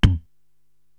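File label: BD Pop Vox, bass drum, drum machine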